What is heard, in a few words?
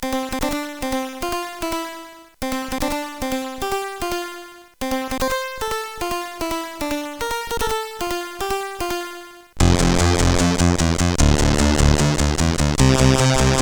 Happy Birthday, CSG8580R5